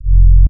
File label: F Sub Bass Hit Uplift